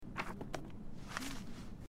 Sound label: put back sketchbook draw; Life is Strange 2; gamesoundopedia